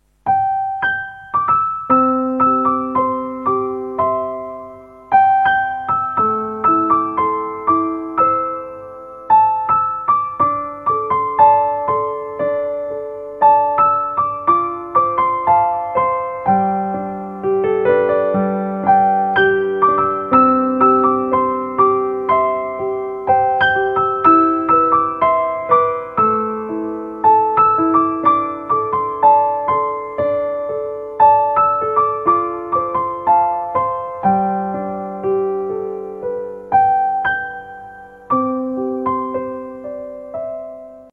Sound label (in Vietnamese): piano version; nhac khong loi